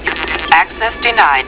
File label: access denied